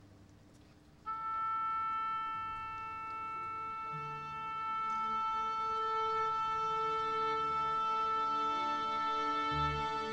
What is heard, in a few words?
Orchestra Tuning Instruments At The Parque Do Ibirapuera